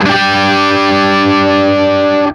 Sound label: 5TH G 3, Guitar; 5THS; East West; Steve Stevens Guitar Samples Collection